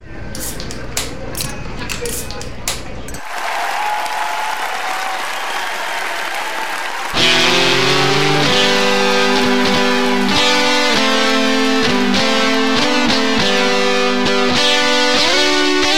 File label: auld lang syne remix